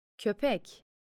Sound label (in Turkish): Köpek